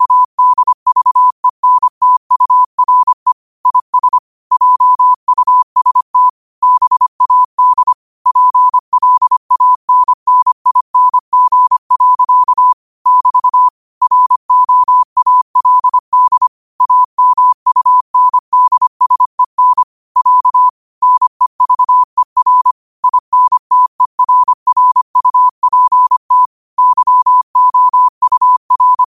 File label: 5 wpm, morse